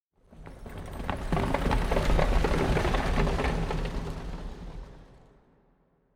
ambience carriage; netent

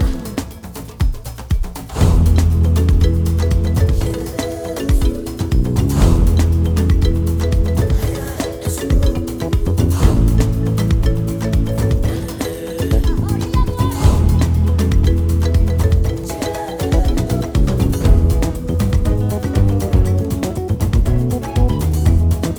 music main game day

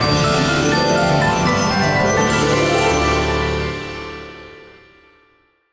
Win Big; netent

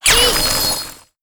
Blue Light Attack; netent

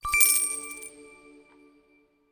coin Win Update; netent

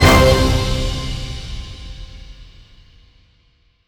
Big Win End

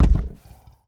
reel stop

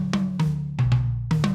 music freespin intro loop fill

